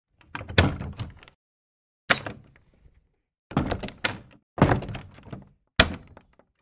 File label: Door Wood Open Close X5